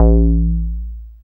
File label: Bass SH-101